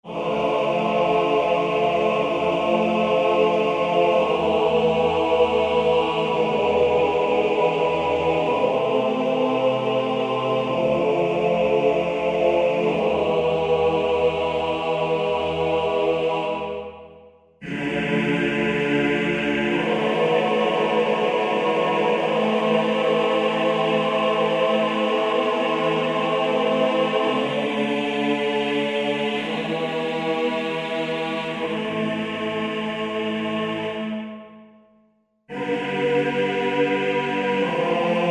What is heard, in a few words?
CHO Mixed; Best Service Gigapack